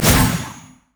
souleater; LoL; ahri

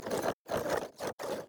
golem bored; LoL; monsters

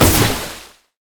hydroblast; nami base

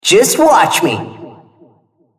attack; RumbleSkin03

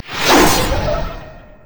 yasuo base crit attack; LoL

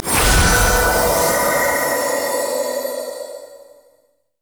summonerspell base heal; LoL

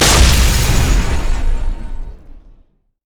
hammer smash; LoL; taric

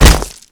basicattack; LoL; vayne

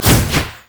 monkeyking decoy swipe; LoL

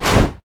basicattack human; LoL; shyvana

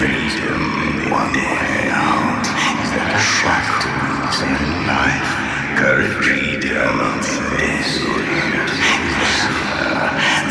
altar unlocked capturing; LoL; altars

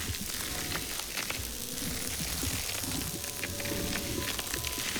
item frozen fist loop; LoL

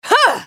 spell cast effort; Shyvana; en US

